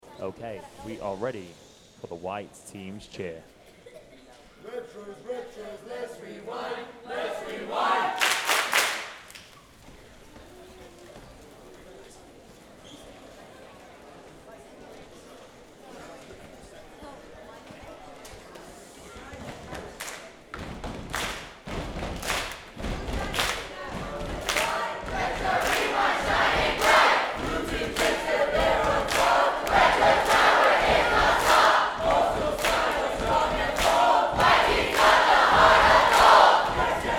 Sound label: Cheer White; color war